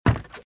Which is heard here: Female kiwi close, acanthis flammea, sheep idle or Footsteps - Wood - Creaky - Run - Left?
Footsteps - Wood - Creaky - Run - Left